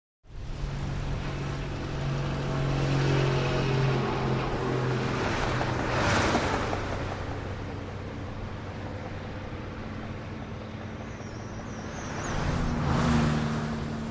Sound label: Traffic; Highway; Mid Day Highway Traffic.